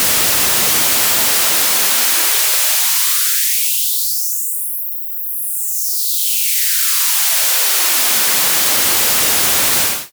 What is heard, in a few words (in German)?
rauschen high pass mittlere bis hohe reso; universal audio moog multimode filter xl test